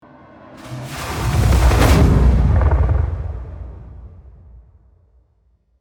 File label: epic whoosh